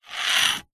leisure poker dealer button chip scrape rim edge on concrete